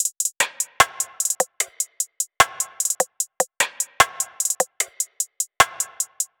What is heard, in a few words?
Top Loop - 150 BPM, Drum Loops; Cymatics; Cobra Hip Hop Sample Pack